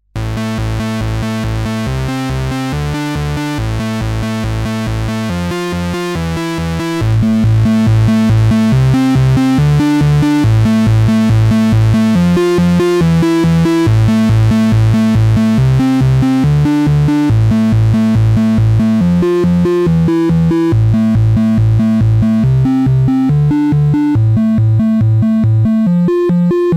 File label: Roland MC-202 VCO TRI